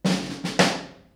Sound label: drumroll snap